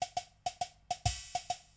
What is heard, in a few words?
organ